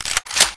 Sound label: bolt pull, ak; hl2rp